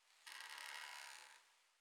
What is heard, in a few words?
wood strain, Night; FontainebleauDemo